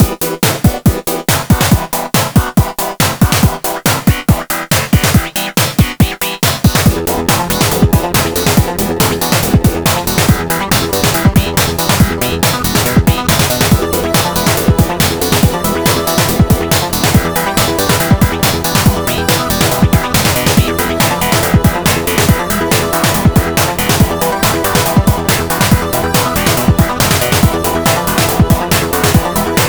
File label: test snare; sylenth